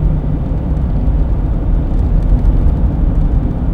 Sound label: Sand Surface; Totaled; XBOX